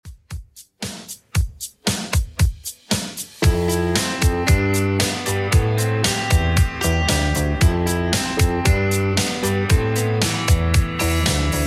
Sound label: 80s Redrum 115bpm